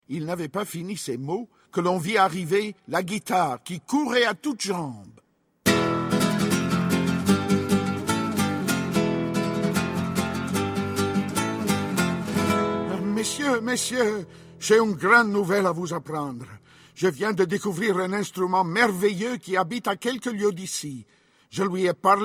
fin cuivres; piccolo saxo

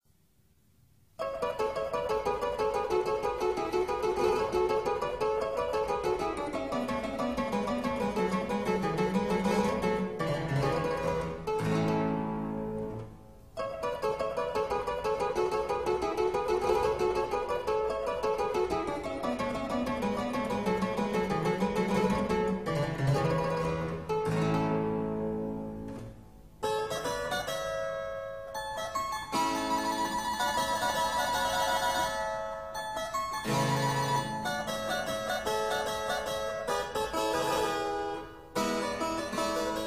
Pièces de clavecin en concert; J- P- Rameau; L 39 Indiscrète; T- Pinnock

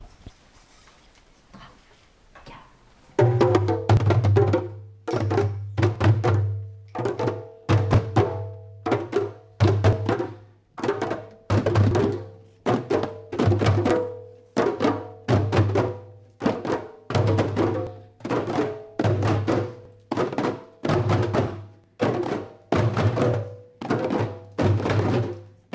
percu

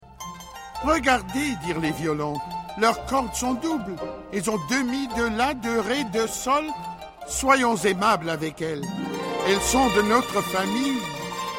piccolo italie barcarolle; passeport piccolo

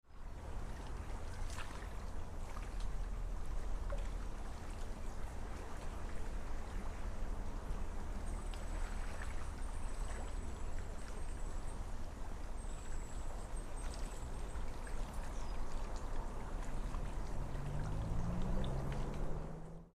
eau calme

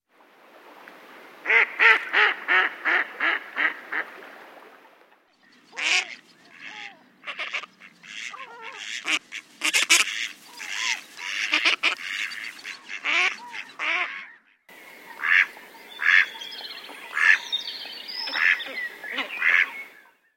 Canard colvert

